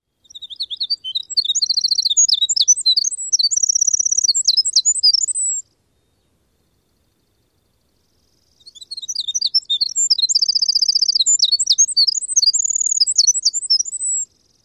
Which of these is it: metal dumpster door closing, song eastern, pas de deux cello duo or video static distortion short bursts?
song eastern